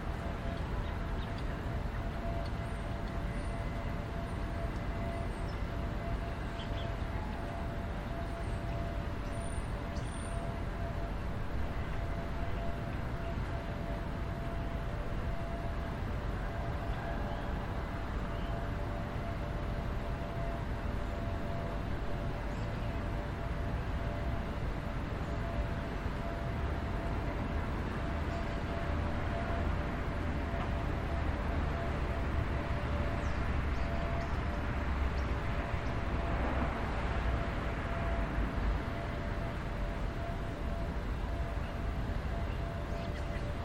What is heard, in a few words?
soundscape; Marston; TASCAM